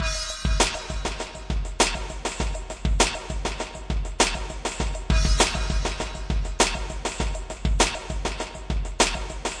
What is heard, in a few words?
china splash beat, drum loops and breaks, 100 bpm; 091 to 100 bpm